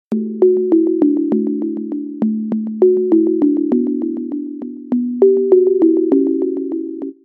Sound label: Happy Christmas Tune